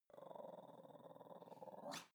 dog snarl long

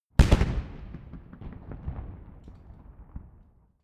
rifle salute fire

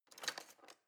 knife wood drawer grab

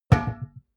glass door small close

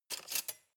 cutlery large drawer grab

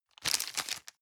plastic bag small turn hard

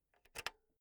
insert jack cable

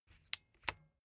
bicycle street gear control upshift

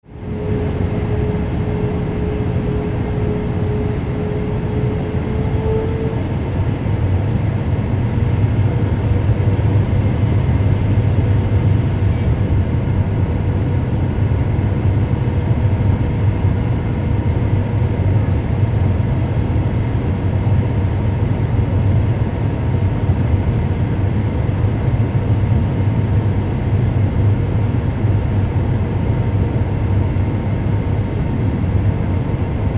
a319 jet cabin approach for a landing

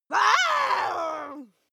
witch yell

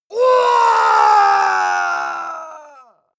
male combat die

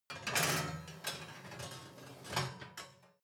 oven baking sheet draw in soft

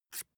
match light fail

